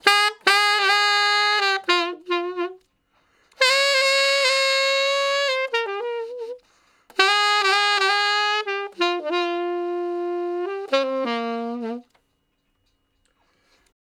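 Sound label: Sax Slow Blues (Bb I, IV, I, I) pickup 1 beat, Tenor Sax; Crimson Blue and Fabulous Horncraft 4 RnB; Horncraft for R&B; Solos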